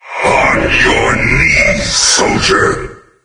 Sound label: taunt; doom3; sabaoth